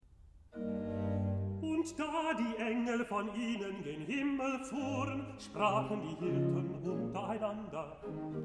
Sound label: Recitativo; BWV 248, Kantate 3, Am dritten Weihnachtsfeiertage